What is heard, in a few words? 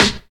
Perfect Steel Snare Drum Sound C# Key, Royality free snare sound tuned to the C# note